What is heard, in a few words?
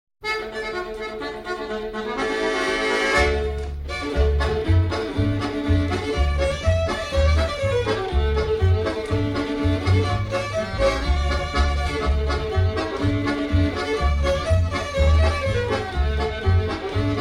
instrumental